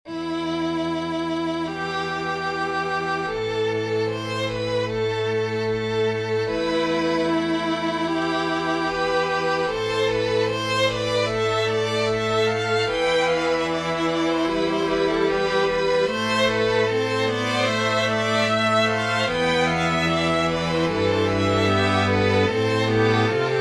Medieval Canon Phrygian Requiem for String Quartet